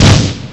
explode

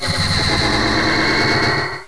APPEAR

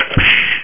lock

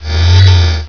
Misc Spell; Ultima III

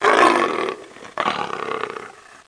GROWL; Total Chaos Nukem 3d Pack; duke

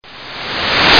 Noise; SONIXV2.0; Instruments

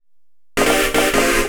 synth, Drum+Bass, 160, C; Keys

